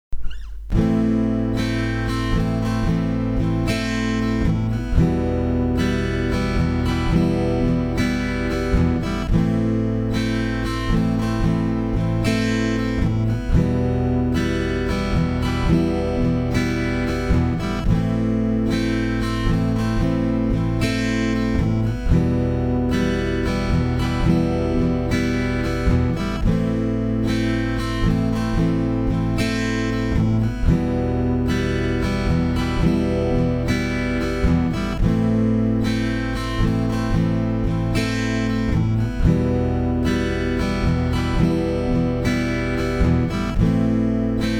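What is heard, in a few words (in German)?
regennacht 56BPM, Loops und Beats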